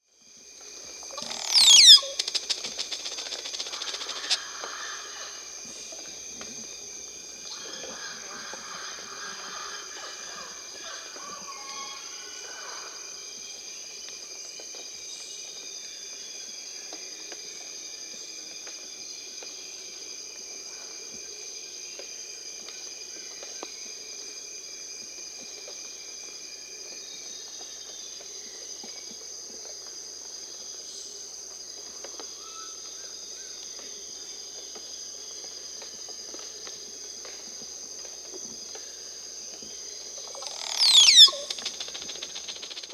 Crested Oropendola (Psarocolius decumanus), Peru, Birds of the Amazon; S. America